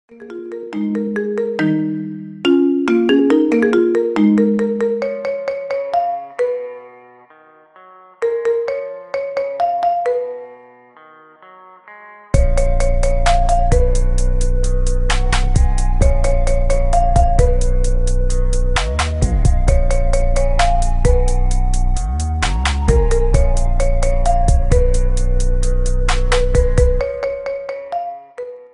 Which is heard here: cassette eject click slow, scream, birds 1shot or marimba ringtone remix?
marimba ringtone remix